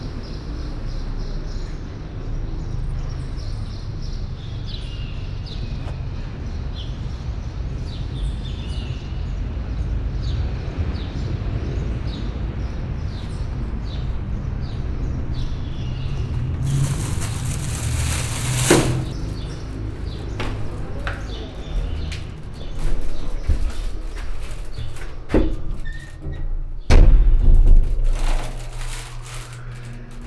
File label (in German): zu Hause, Fieldrecordings Brasilien